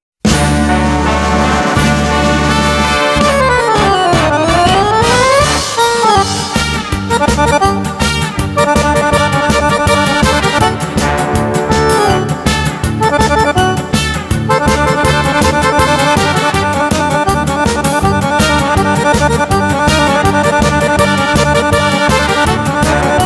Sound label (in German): virituoses akkordeon